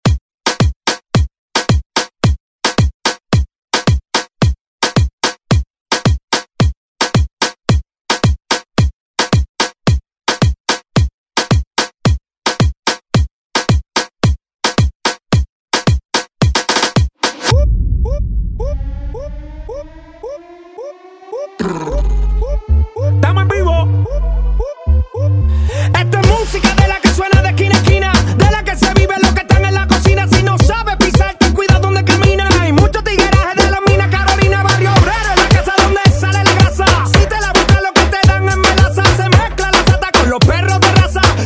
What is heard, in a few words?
moombahton redrum